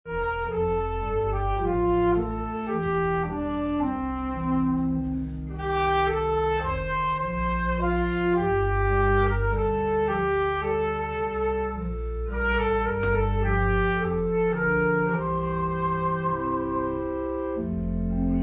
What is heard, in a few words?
Saxophone prelude